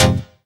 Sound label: Synths C; Vengeance Samples